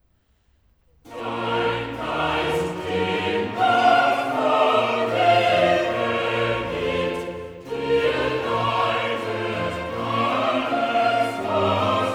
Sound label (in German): Choral; Cantata, BWV 108 'Es ist euch gut, dass ich hingehe'; Bach; Warwick; 4th Sun After Easter